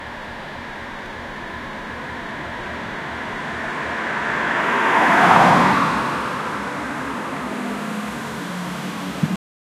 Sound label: a car driving down a; vehicle